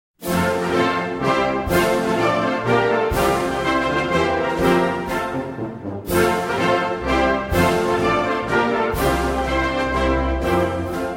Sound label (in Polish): Hymn Polski wersja instrumentalna